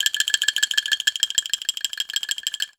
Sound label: ANGKLUNGS; Voice Crystal - Steve Reid Definitive Percussion; ERIE FILM-Q